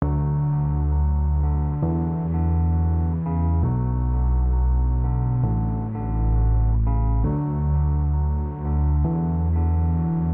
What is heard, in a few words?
gtr